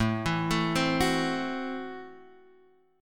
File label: C 6th Flat 5th A